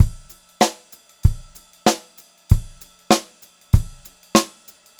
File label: 96 CL VARI 6, Pop-Rock Drumloops; USB Soundscan vol.37; AKAI; 90 s Sample CDs